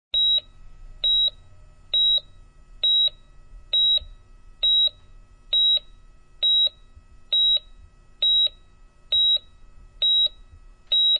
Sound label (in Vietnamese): Tieng may do nhip tim keu